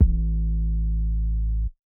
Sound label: 808's